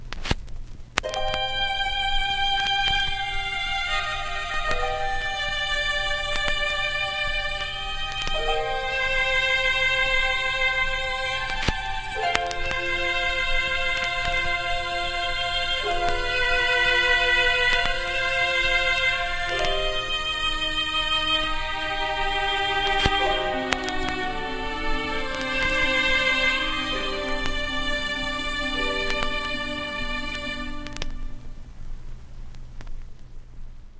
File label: Sad Music; There Is No Game: Wrong Dimension